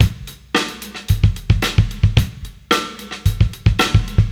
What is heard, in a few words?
live beat (funk); beats; Sonic Foundry (Sony Creative Software); Ugly Remnants VOL-2